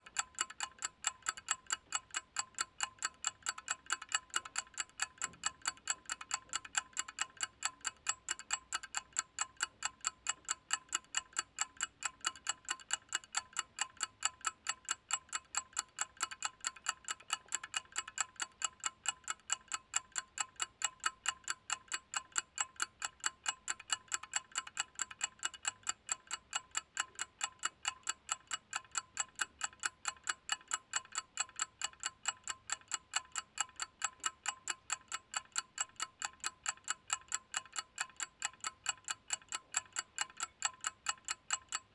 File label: Wall clock